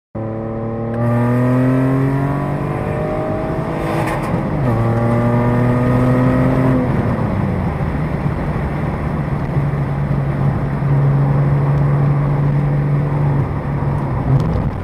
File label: Xantia Activa